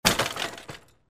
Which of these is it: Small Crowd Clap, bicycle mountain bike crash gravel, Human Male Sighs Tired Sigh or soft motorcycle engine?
bicycle mountain bike crash gravel